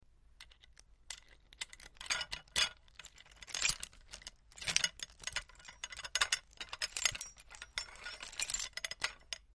Putting On A Steering Wheel Lock Movement With Key Jingles; The Club